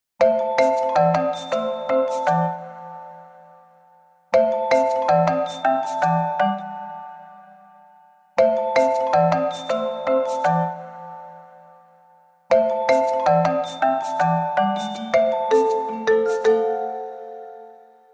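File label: Happy marimba, ringtones; Tinno ATT Calypso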